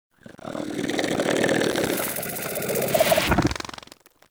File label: Bug Vocal Snore; Characters; Warframe; Hunting Critters; InfKDriveBug